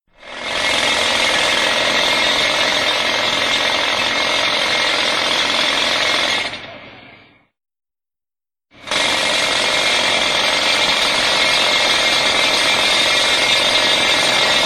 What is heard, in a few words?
street sound